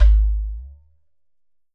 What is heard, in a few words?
GHATAM